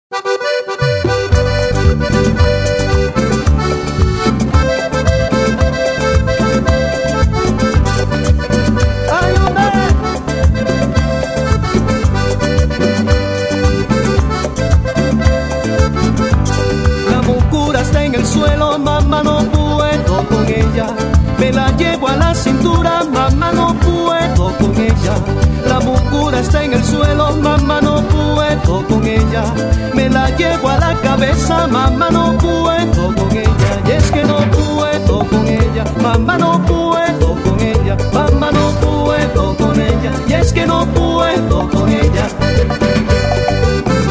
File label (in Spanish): Latino